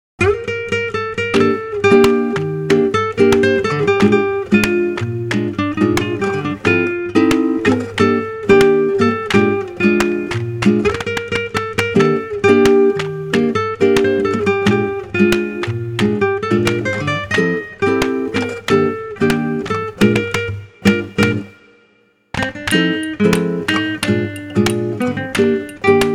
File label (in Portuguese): porcoespinho e violao, violão